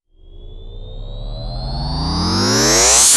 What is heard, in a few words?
Sweeps Medium